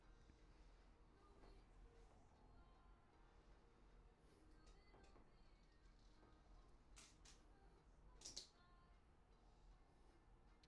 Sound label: BG Vox